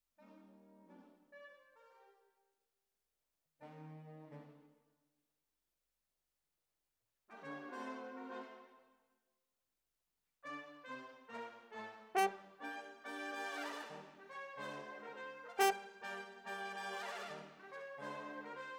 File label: Horns